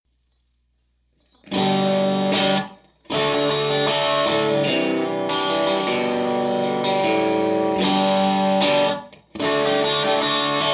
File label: Strat; Pushy Tone Dawg